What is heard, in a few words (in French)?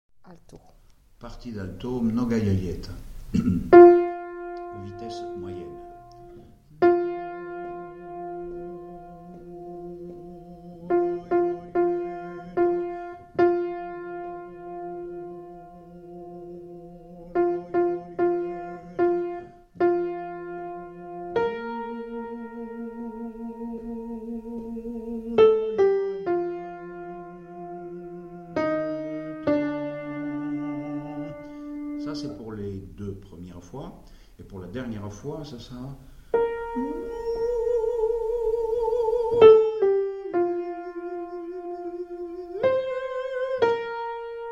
alto; mnogaia lieta